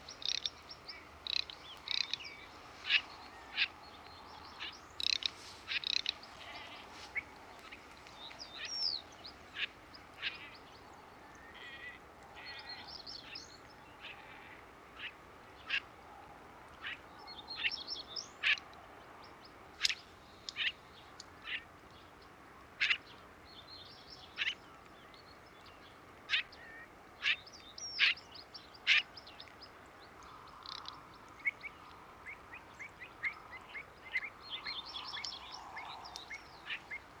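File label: garganey spatula querquedula; utok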